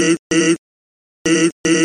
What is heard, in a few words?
ring; sans